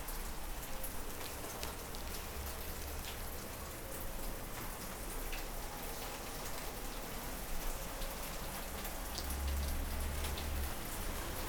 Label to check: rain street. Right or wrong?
Right